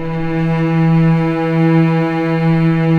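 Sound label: cellos E3, String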